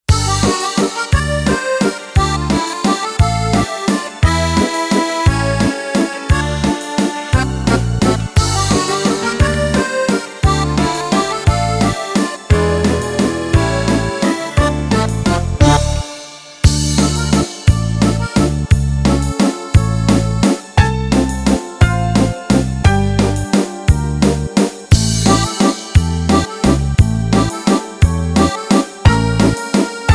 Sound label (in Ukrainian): vals